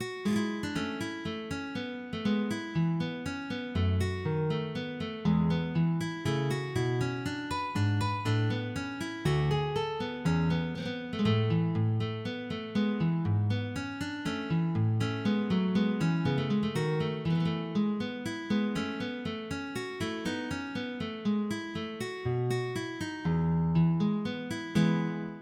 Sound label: Sarabanda